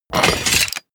robot transformation metal short

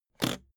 suitcase latch lock jump open

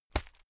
hit plastic fleshy

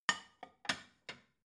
plate shelve soft slow

